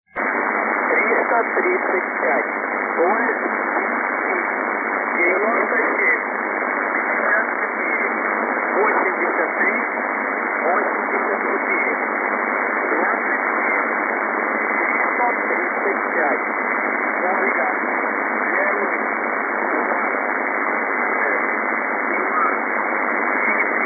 The Squeaky Wheel

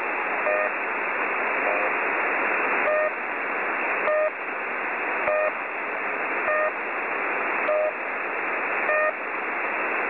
the pip marker, 3756.0kHz

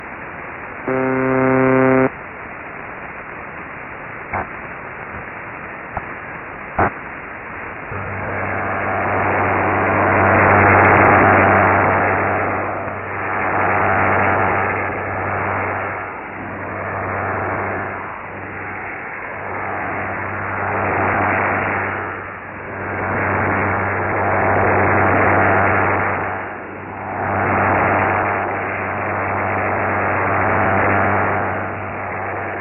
digital

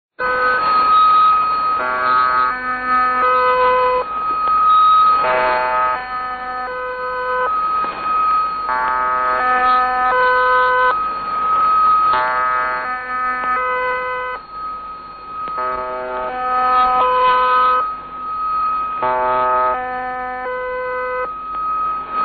Three Note Oddity; G04

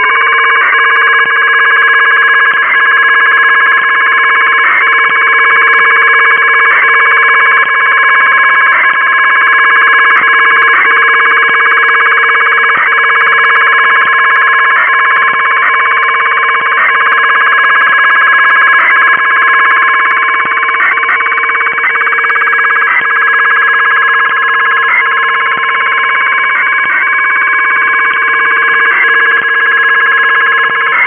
data transmission; 5124

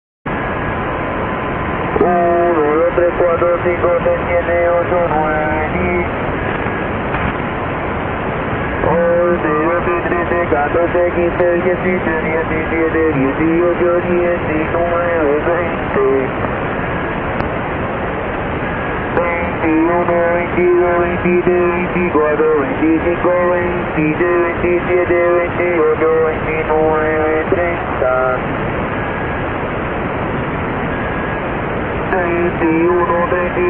Cuban Babbler

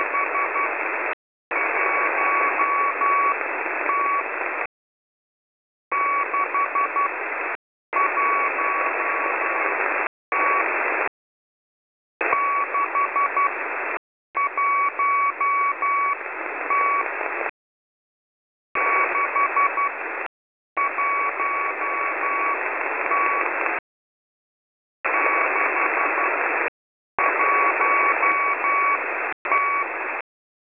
3180.0kHz

